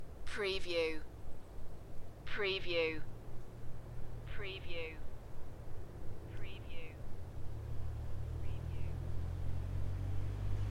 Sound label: Welsh Forest ambiance distant cars and drive by; beanstalk audio